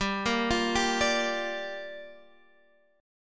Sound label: A sharp 6th G